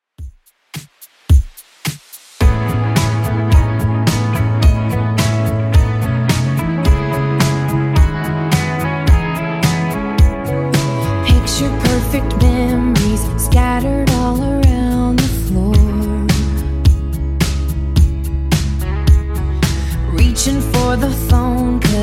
Alternative, 108bpm

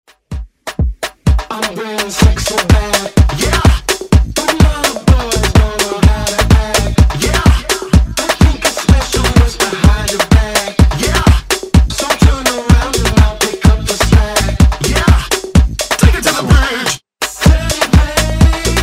Afrobeat Redrum

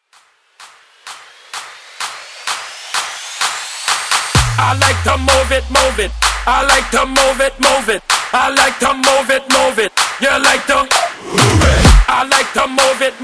Clap A Pella Starter

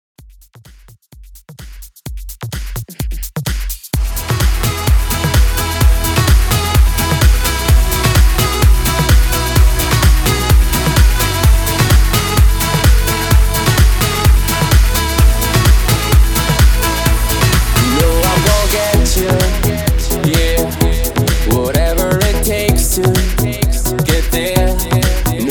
REDRUM 128 BPM

